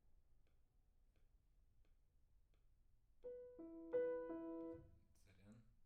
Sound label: PIANO